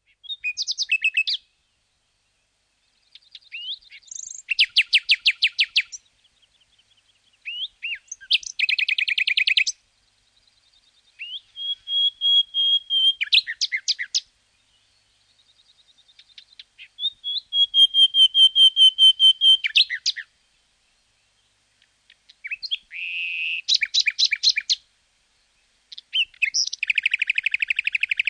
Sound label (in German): nachtigall